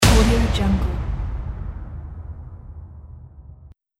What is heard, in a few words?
trailer hit; fainstudio